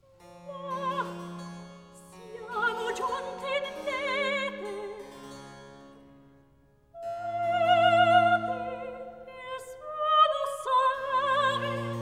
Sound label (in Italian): Recitativo; Delirio amoroso HWV 99; Ma siamo giunti in Lete; Handel Masterworks; Klassika